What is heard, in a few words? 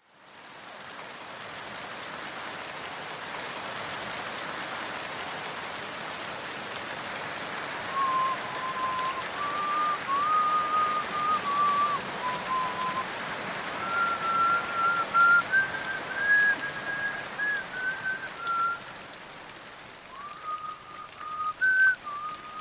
soundscape frog symphonies